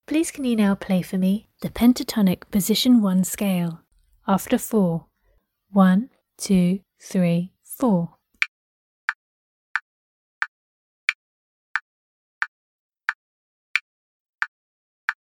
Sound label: Pentatonic position 2